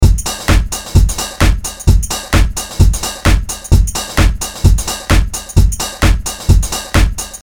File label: pitched up